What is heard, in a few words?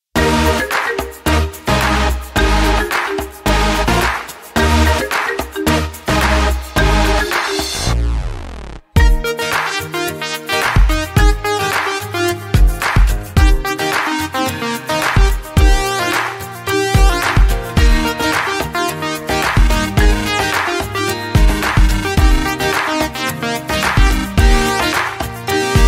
Main Theme Instrumental; SonTreasure Island 2014